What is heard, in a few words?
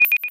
notification chime